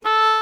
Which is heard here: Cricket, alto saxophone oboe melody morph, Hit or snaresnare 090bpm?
alto saxophone oboe melody morph